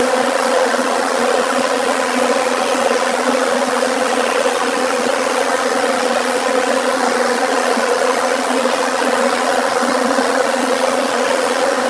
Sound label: bees